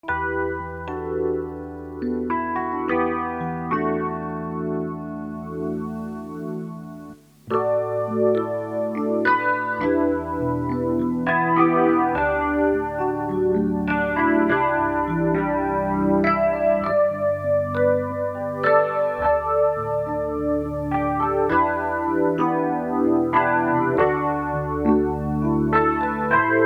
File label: Eventide H3000 Micropitchshift E-Piano